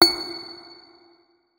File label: notes, guitar; Hideout Furniture